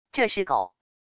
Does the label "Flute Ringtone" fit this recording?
No